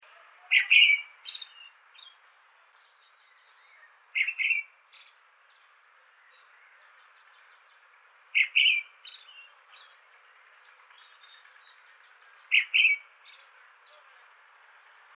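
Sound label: Turdus feae, Kiina; May 2002 Lao Ling, near Qinghuangdao, Hebei, China.; Linnut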